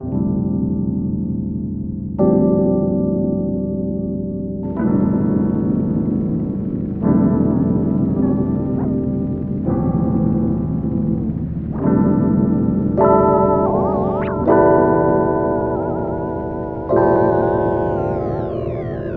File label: Rhodes Pitch Automations